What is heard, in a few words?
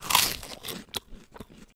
eat